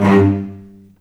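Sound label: G1, VCS arco, strings, L; East West; The Ultimate String Collection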